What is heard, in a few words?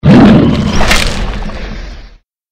mirelurk attack power forward; magmalurk; npc